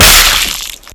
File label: splat